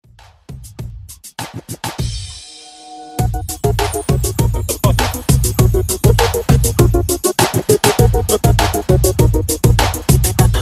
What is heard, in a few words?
RB Dj Intro Outro 100 BPM